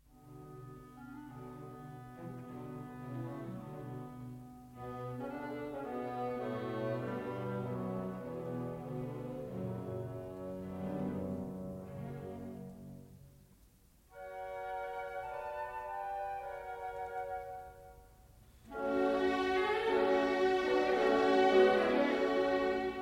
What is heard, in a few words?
Cello Concerto in B Minor, Allegro; Dvorak